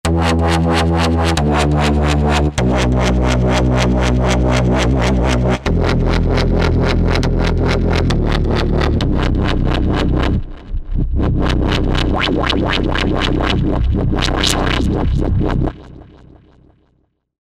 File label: Metallo